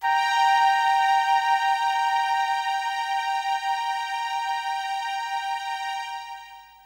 Orches, Techno Melody; TechnoMusicMaker

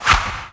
fly in; Superbike 2001

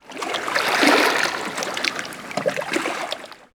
battl water

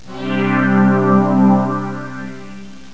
Faze In

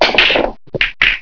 rattle; Deers Revenge

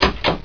SWITCH; yoda

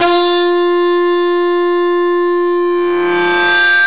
feedback tubeola

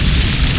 phoenix travel; Heretic II demo; weapons

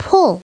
pull

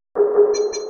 AMBNT 160; eJay; Ambient

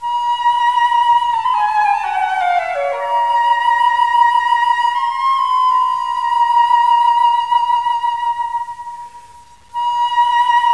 flute

